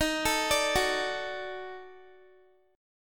Eb Minor Major 7th Flat 5th, down, Guitar; arpeggiated; 4F,4A,5C,5G,5C,6E; chord; JGuitar

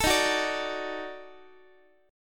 D sharp Minor Major 7th Flat 5th, Guitar; strummed

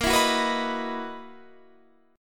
F sharp 13th A sharp

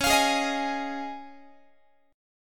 Db Major Add 9th, Guitar; strummed; JGuitar

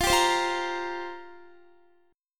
F Suspended 2nd Suspended 4th F down Guitar

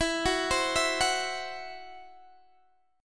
E Suspended 2nd Sharp 5th E, down, Guitar, Open D

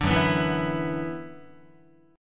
F sharp 7th Suspended 4th Sharp 5th, Guitar; 5,2,2,2,3,3; 4A,4E,5A,5D,5Gsharp,6Csharp; strummed

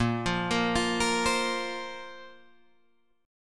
A sharp Suspended 2nd, down, Guitar, Drop D

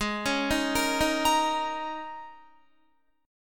Ab Suspended 2nd Suspended 4th, down, Guitar; arpeggiated; Absus2sus4

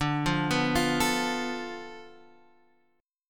D-Minor 6th, D, Guitar, Standard; 10,8,9,10,10,x; Dm6 arpeggiated

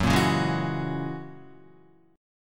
F sharp 9th F sharp Guitar; F#9 strummed

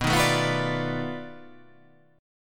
B 7th Sharp 9th Flat 5th, down

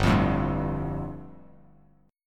G 7th Suspended 2nd, Bass Standard; strummed; JGuitar

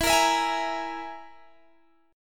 E Major Flat 5th E, Guitar, Open G; chord shape

